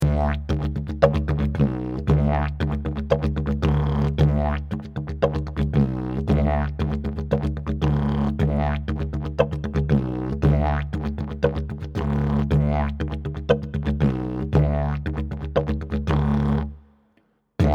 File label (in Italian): ritmo livello avanzato